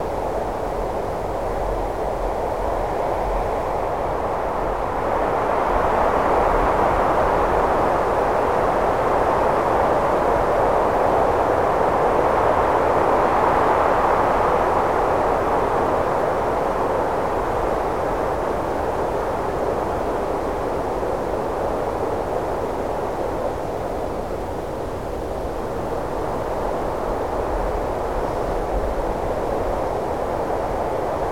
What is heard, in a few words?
Atmo Holy Land